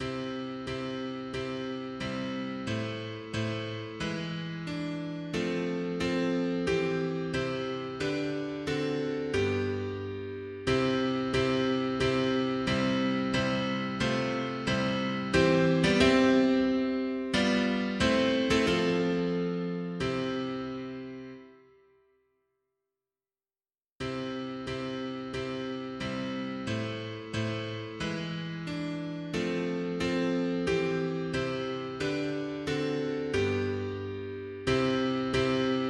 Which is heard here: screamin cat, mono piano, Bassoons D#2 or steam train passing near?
mono piano